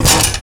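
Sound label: hi-lo impact, metal impact; Total Control - Action Cutz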